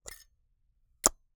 Camera, Argus, aluminum, steel, professional, fully mechanical, film compartment, open close; Eiravaein Works; Vaeyan IV